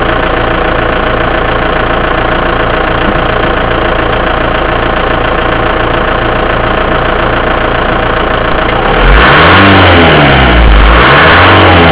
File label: Audi A6 Sound